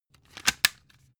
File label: cd cover close double